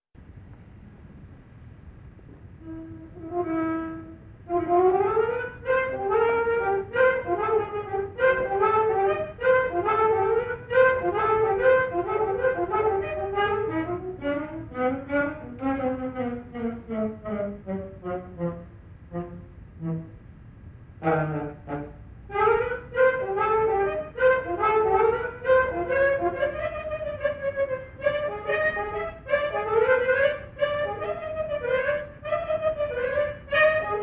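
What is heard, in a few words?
saxophone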